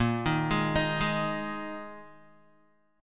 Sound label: A sharp 7th Suspended 4th down Guitar 4E 4A 5D 5G 5C 6F; A#7sus4; arpeggiated